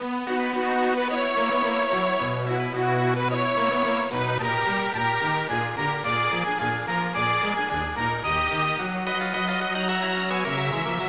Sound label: In-Game Music; snes; shinri game 3